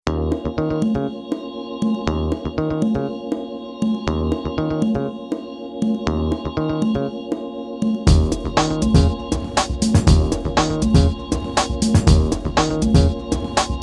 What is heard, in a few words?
Off Melody, funk